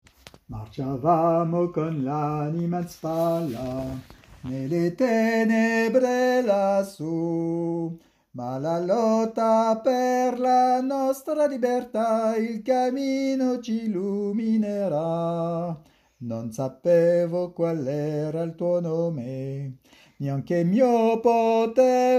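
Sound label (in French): voix haute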